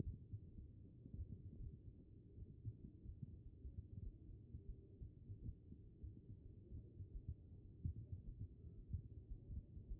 HEART; B MODE

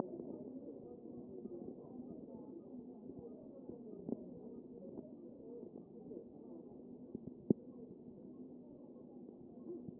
CHEST pos4 D MODE

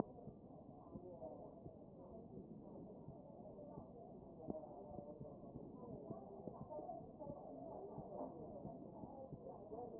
chest

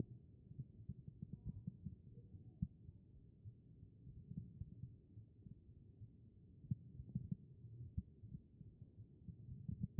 HEART; B MODE

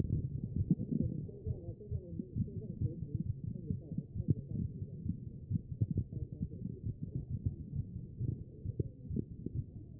CHEST; B MODE

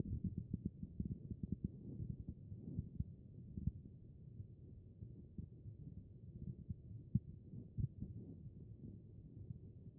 HEART; B MODE

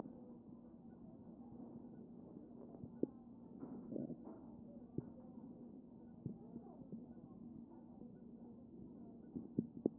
CHEST pos0 D MODE

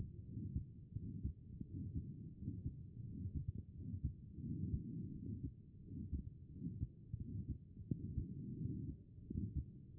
HEART B MODE; cardiac

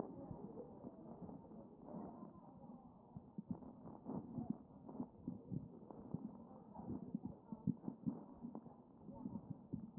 chest

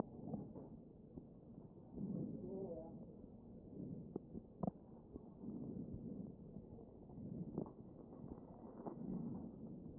A CHEST

